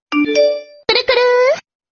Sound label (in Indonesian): nada notifikasi wa kuru kuru